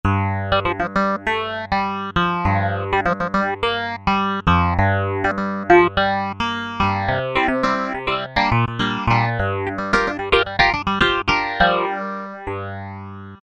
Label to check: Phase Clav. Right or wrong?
Right